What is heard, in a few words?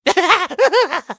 laugh; Twitch